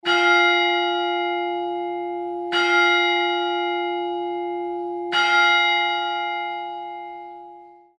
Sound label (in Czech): zvon matous; zvonohra